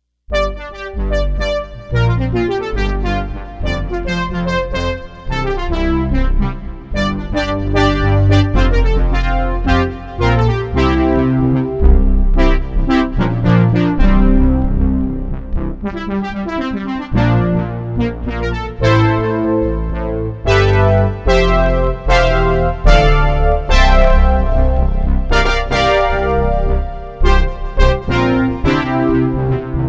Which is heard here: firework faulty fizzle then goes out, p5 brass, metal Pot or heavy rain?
p5 brass